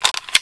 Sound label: clip release, weapons